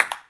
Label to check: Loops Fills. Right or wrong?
Wrong